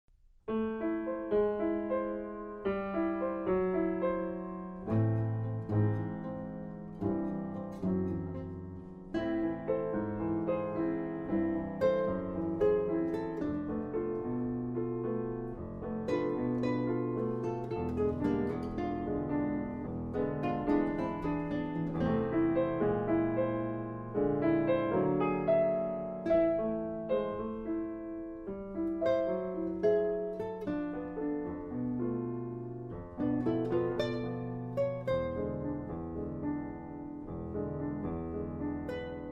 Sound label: Syncopated Nocturne